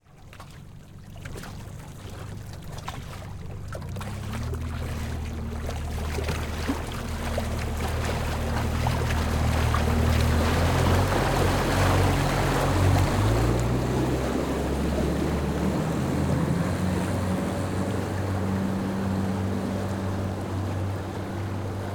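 Boat Motor Boat Mercury EXTERIOR Pass By Ride Away, Lake Boat - Mercury 4-stroke 60HP; BOATMotr; STEREO; MKH8040 MKH30; Dramatic Cat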